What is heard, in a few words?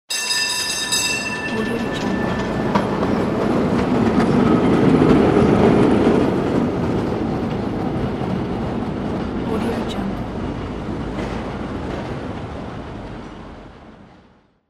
tram; cat track